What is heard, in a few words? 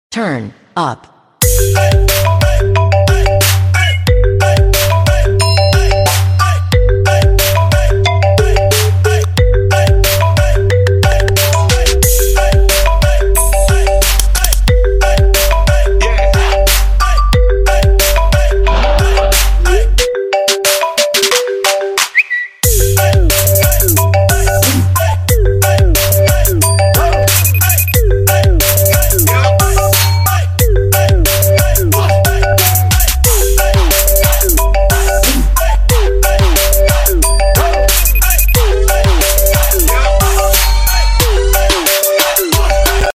nokia best ring beat